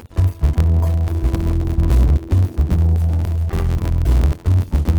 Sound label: Latino